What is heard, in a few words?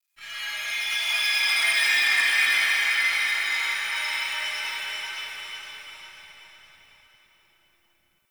Glass Particles; Warframe; Ambience; Nokko Colony